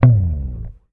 HOFNER, Bass

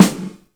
CHOP SNARE; InVision Interactive; Lightware VOL-1

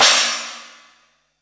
crash L; Zero-G; Chemical Beats; CRASH ZOO

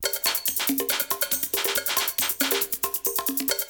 LOP, BREAKBEATS

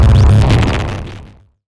Cwejman S1 Rumble

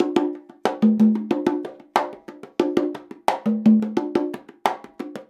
CONGA, Percussion Loops; USB Soundscan vol.36; AKAI; 90CONGAS

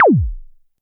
korg trident

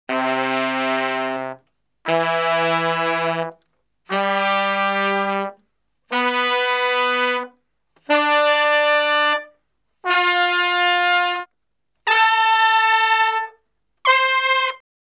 Salsa Picante; Masterbits; World Zone VOL-3

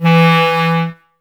wind clarinet, Clarinets, Wood Winds

